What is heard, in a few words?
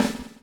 BUBL, BUBBLE; Spectrasonics - Backbeat